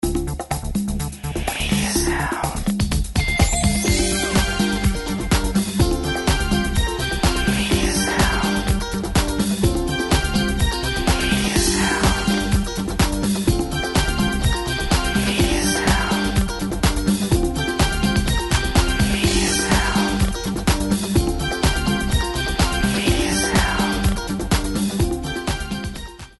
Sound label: Disco - 125BPM, House - Dance